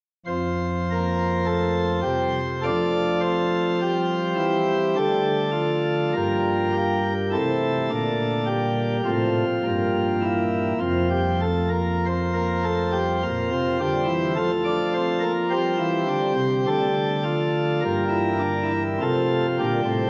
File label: Organ, with Subbass 16; OrganBooks